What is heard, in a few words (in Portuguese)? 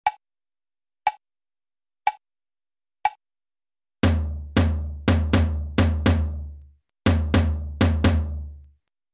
imitacao ritmica